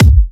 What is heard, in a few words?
Hard Kicks; Vengeance Samples